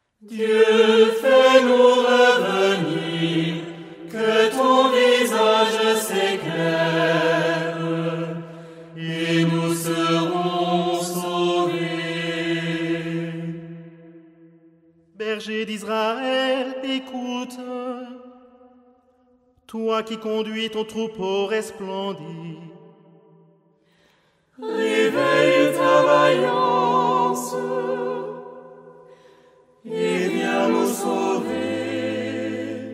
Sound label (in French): ensemble vocal psaume 79; hilarium; dieu fais nous revenir